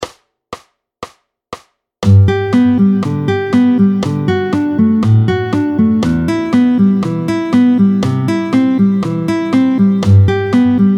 Swell Vite 120, le picking les arpeges aux doigts

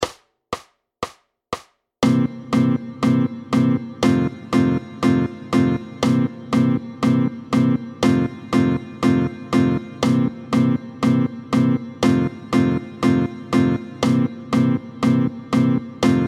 Les pompes 120, Les pompes, battement tempo 120

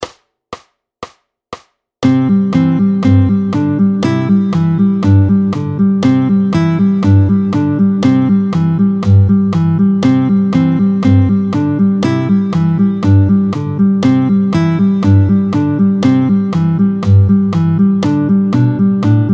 Au clair de la lune Vite 120, picking

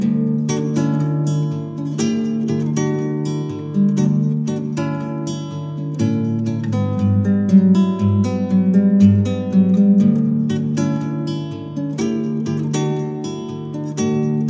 Guitare nylon

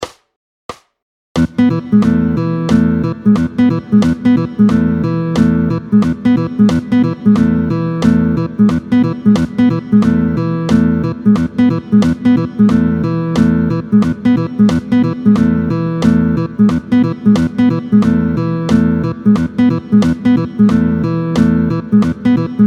Vite ternaire 180, le picking les arpeges aux doigts

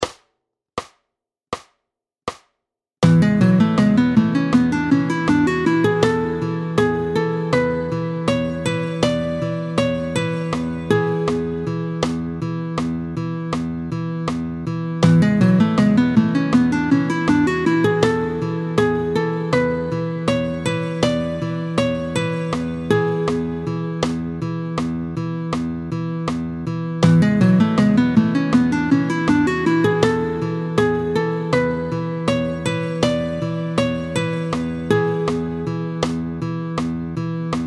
Mixo, Le mode de mi mixolydien, tempo 80

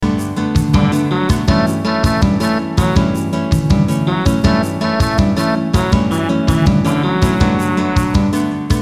Trompette et General, Trompette dans l’arrangement; Elle joue le petit thème, construit sur six mesures

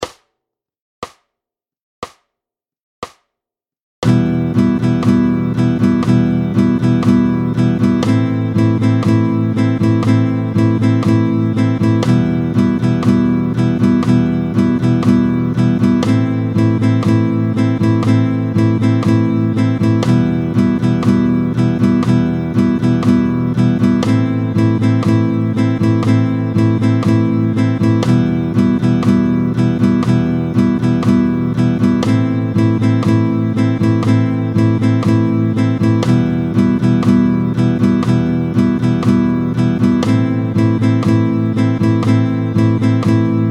Croche doubles croches 60, 1 croche – 2 doubles croches, battement tempo 60

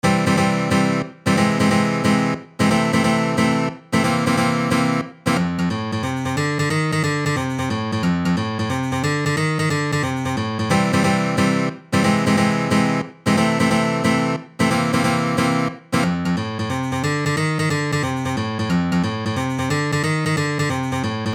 Bidon guitare

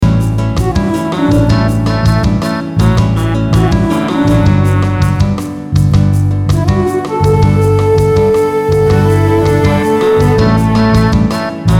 Piccolo femmes, Piccolo dans l’arrangement; Piccolo et choristes (choir)

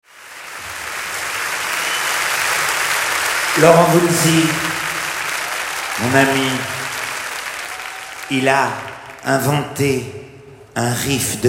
Riff

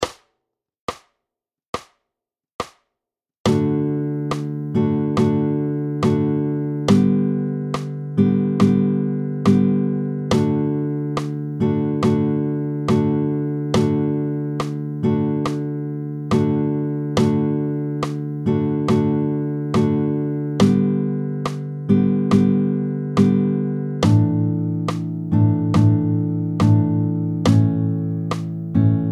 Rythmique tempo 70

